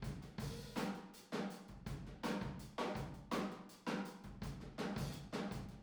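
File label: Boogaloo, Room Stereo, 82 BPM; Drums; Verse; Sonic Foundry; Drums from the Big Room The Mixes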